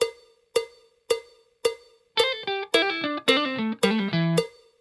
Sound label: blues tricks full, guitar